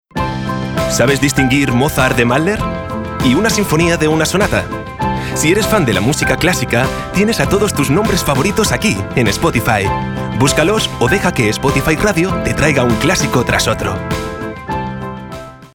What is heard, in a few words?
CLASSICAL MUSIC